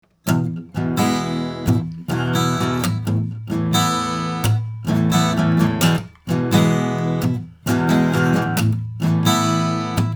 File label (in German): ELA 251E Git